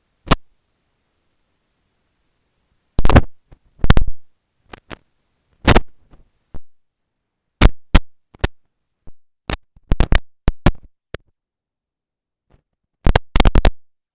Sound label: Cable Problems